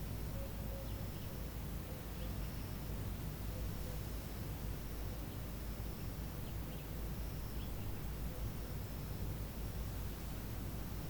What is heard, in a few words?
indoor play container night; anemoi